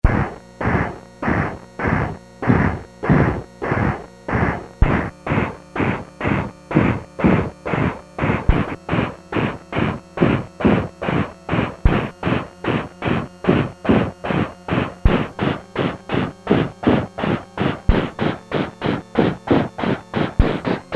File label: Train, Casio SK-1 sampling; Sampling sound